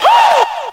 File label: "woo"